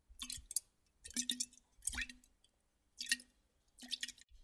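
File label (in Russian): красное вино в винной бутылке. бутылка почти полная жидкости без пробки и отверстие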